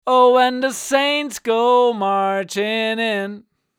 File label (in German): Gesang Sm7B LoZ Neve1073DPA